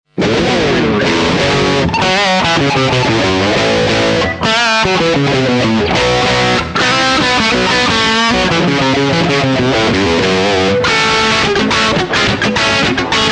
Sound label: blues engine neck dirty